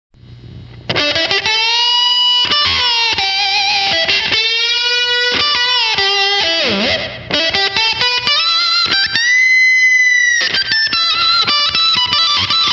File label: octave, guitars